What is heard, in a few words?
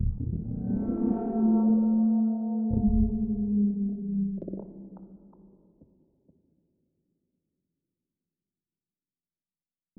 tiyanki reanimated move vocal; Stellaris